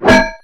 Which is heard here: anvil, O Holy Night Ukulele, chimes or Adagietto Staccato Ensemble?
anvil